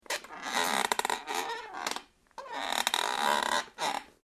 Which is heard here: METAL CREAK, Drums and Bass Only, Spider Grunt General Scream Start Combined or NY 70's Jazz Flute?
METAL CREAK